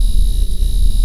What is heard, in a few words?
Synth Bass